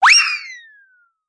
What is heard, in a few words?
vintage whistle zing, Cartoon Sound Effects; UNUSUAL KITS and FX